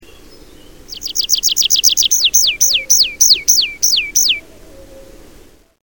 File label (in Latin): Anthus trivialis